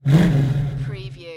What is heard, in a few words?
Mustang 68 Fastback Rev Exhaust Perspective